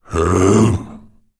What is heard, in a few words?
afflicted m attack